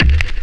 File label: Tribal Tom; Mo' Phatt; Digital Sound Factory Mo' Phatt